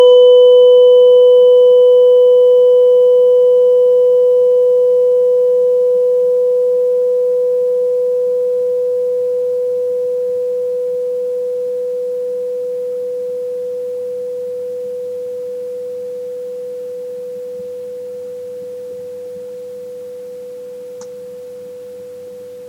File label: triple heater, tuning forks; meridian